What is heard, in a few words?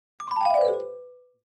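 Clavichord Descending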